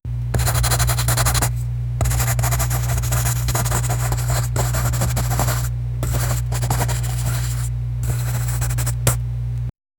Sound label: drawing with marker on a